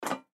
Teapot Metal Open, Teapot Metal Open Lid Antique Tea; Kettle; Dishware; Household; Blastwave